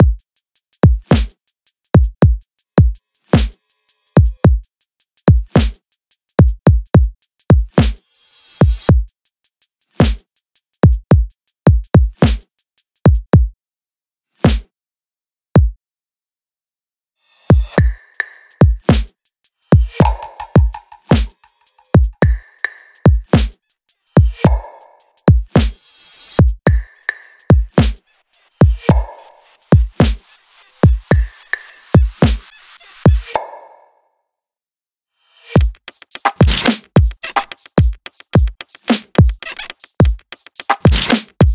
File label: 108bpm, drums